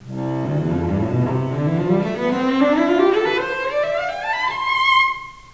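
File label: 4 octave scale, cello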